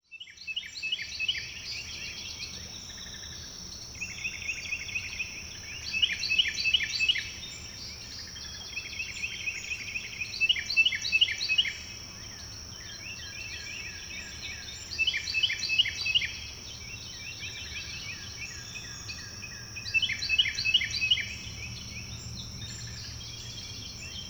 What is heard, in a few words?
Crows pass overhead at dawn